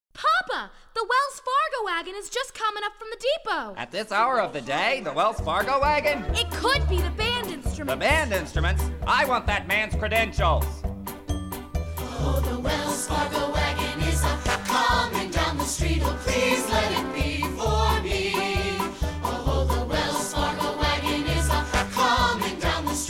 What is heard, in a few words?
W Vocals; music man jr rehearsal music